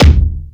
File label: BD, Dance Mega Drums, BD HOU; Best Service